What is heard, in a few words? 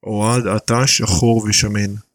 fart sound